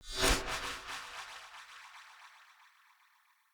Soul Steal Pull Loop End

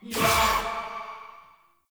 Choir Erupt Proj Throw

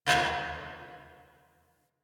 Parking Lot Metal Door Distant

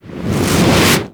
Environment Explosion; Gameplay; Warframe; The New War; Mission Four

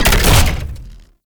Mech Shield Stop; Vehicles; Abilities; Thano Mech; Warframe; Nechro Mech

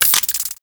Modular Clip Large Mech, Guns; SU Modular Guns; Clips

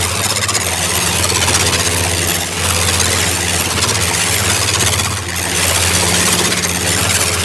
Beam Parry Loop; Warframe; Bullet Impacts

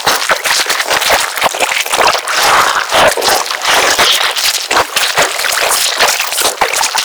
Siphon Blood Loop; Warframe; Warframes; Garuda

